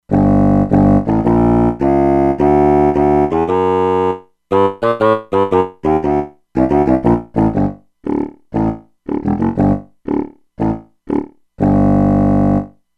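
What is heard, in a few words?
Contrabassoon, E-mu Proteus-2; Factory Presets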